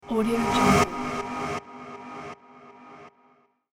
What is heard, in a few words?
transition airy horror long; transitions and movement; ترنزیشن ترسناک با اکو